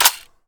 Sfx Jammed Weapone; Akxolotl

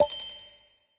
Room Appear

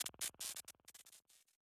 Boss Core Hurt Stinger; Avie

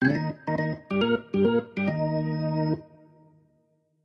Organ; Fourth of July 2019

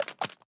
Pick Up Generic; Going Under

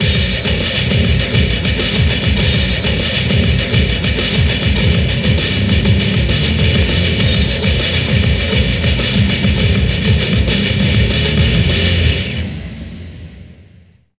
Ditty; nfl gameday 2003 ps2 gamerip 2002

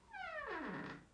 Door creak; Five Nights at Freddy's 4

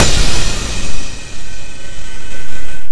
Cymbal, Db4; Guitar Rock Tour

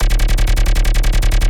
Bass Psy 160 D

